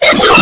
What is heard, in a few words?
atmospheres